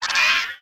harpie wind up; enemies

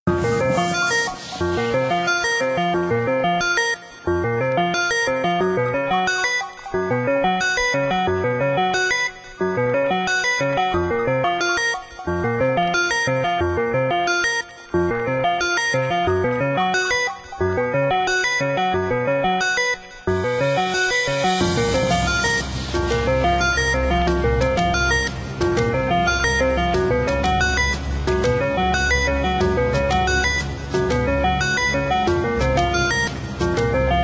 Hybrid Cinematic Sci-Fi; gamerip; menherafflesia flowering abyss; android ios mobile switch windows

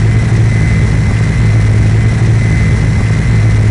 Scifi Racecar Idle Loop; gamerip; Mars Sortie

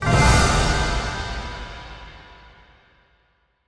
flee success; curious expedition 2 macos ps4 switch windows xbox one xbox series xs gamerip 2021

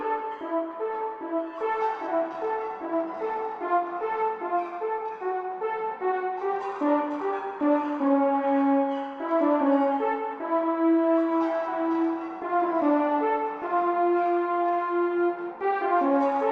scene brass loop

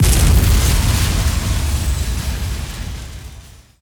Building Floor Collapse

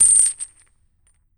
Spectral Chains Hit; Damned

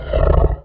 Breath; Nian Breath; Knight Online

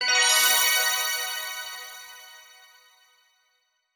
JINGLE STATUE GET; BALANGAME; Balan Wonderworld demo; Xbox Series X|S

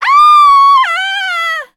Enemy Generic Human Death Falling; Rampage

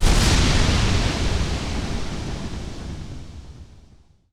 Firewalls Produce

